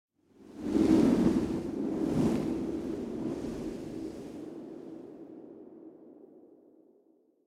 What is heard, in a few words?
Wind Gust Short Passing Whistling Wind; Hollywood Edge; sound designer's toolkit v2